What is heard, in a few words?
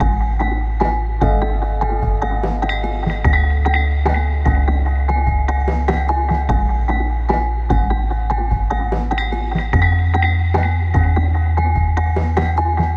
forks, soundtrack; 2kki